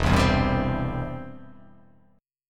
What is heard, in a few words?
E Minor Sharp 5th E down